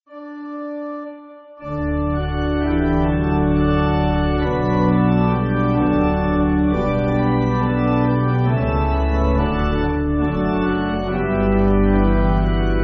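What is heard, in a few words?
Pipe Organ, church backing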